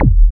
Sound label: BD, Synth; Sound & Vision - Gigapack II CD 2